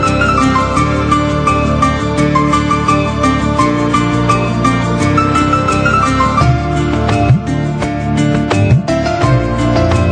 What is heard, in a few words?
Romantic Instru